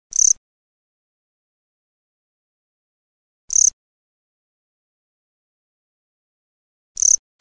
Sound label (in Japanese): kumakoorogi, insects